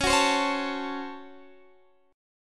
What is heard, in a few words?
C sharp Major 7th Suspended 4th Sharp 5th C sharp, Guitar Standard; strummed; chord; 21,21,22,18,22,x